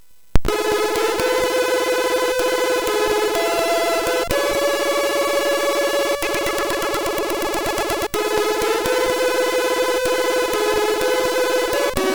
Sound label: Game Music, MOS6581R4